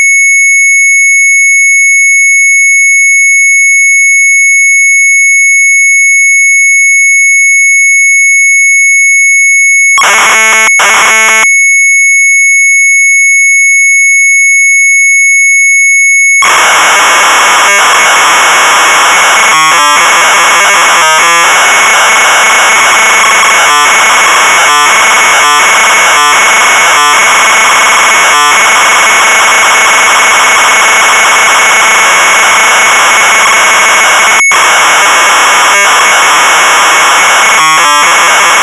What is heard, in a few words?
Sharp MZ800 Tape; MONDRIAAN; retromelsarchive